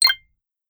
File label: new message sound; chatify